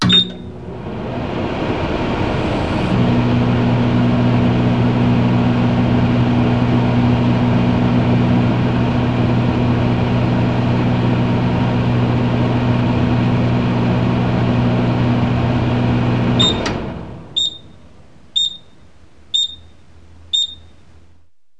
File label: Microwave Oven; KABOOM! - Wild and Wacky Sound Software; Household; Kitchen